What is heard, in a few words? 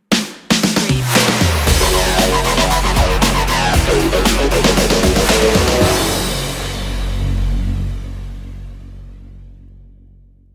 boots of fire stinger